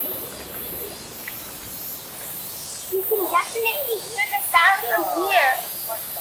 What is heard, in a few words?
Cicadas In Tokyo